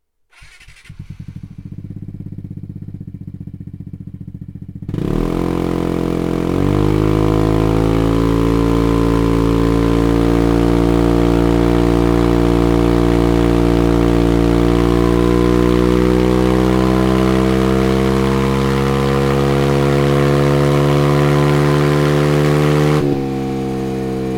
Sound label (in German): Akrapovic Anlage mit dB Eater Vespa